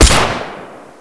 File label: light rifle shot; WAR